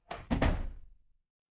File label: door close